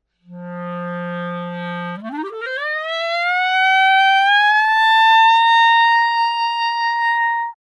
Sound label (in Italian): CL Glissato